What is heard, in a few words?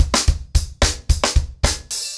110BPM, acoustic drums; The Roots Drumloops